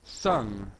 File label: sung